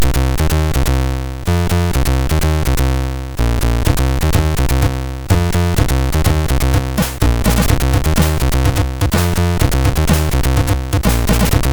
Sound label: Heavy Steel sid MOS6581R3